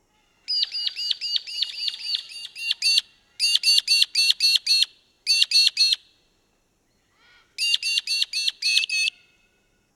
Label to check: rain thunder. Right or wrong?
Wrong